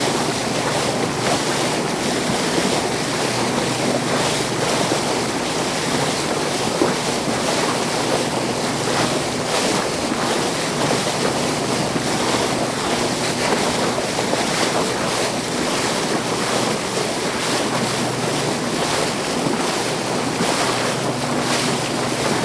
transport boat 700hp dual engine crusing front of vessel waves hitting; keel; bavaria; tom chapman